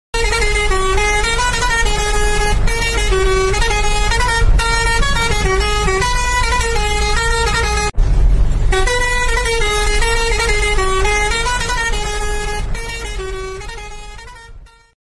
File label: Truck Horn